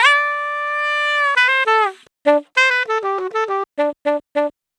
Sax 100 bpm G, Saxaphone; Quazibeat; African Secret

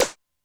Lo Ethnic, Alesis D4 drum machine

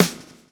lash, backbeat

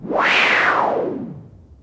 Yamaha CS5, Filtered Noise

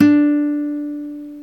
GTR nylon

